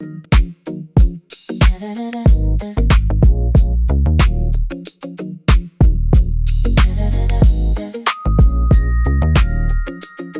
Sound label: loop